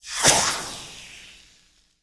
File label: Steam Sizzle Hit